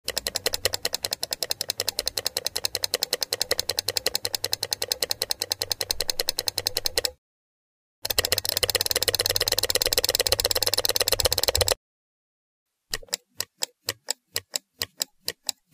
Multiple mouse clicks

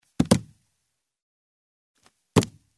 Barefoot jump on the wooden floor; steps

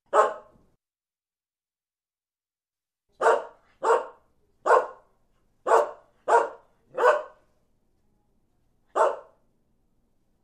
dog single barking terrier kennel

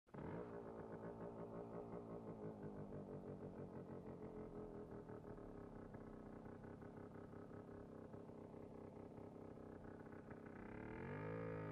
Motorcycle approaching, turning off

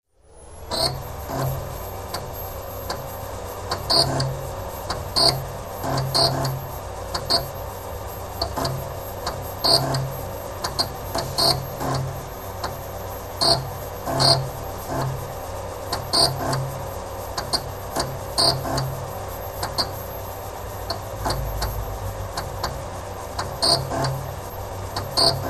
Reading a floppy disk; computer

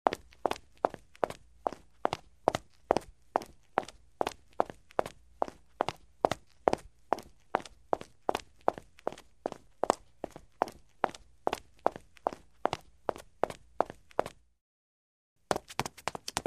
Running on a brick floor in heels; steps

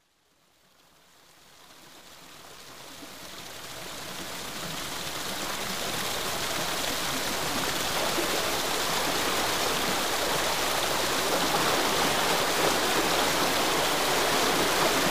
River flows

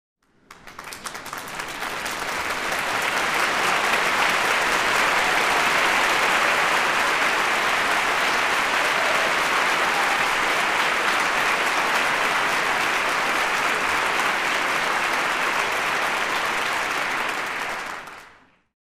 Applause in the theater; standing ovation

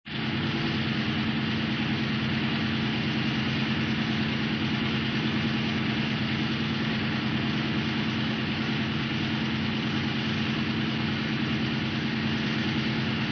Submarine moving at high speed (inside)

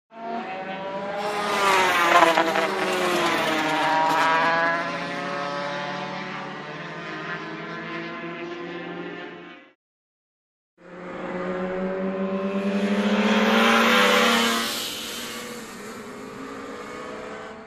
Two ring motorcycles pass by; motorcycle racing

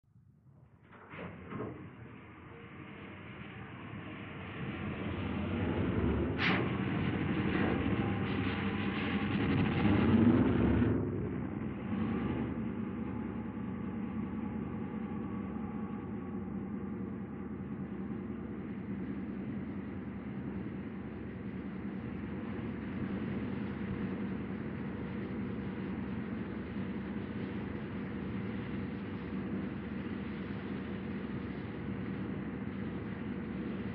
Engine start, submarine moving (inside)

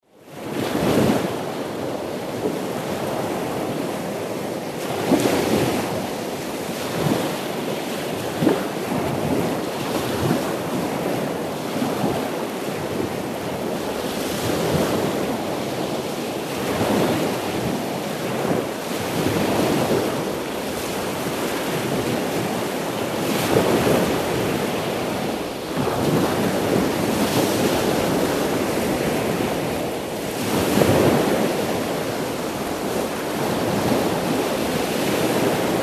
Waves of the sea, ocean

